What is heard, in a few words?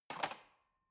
cutlery fork drawer handle